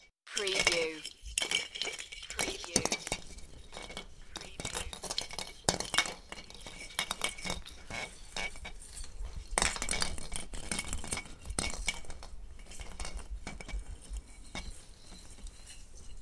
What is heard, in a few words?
Christmas baubles knocking together; beanstalk audio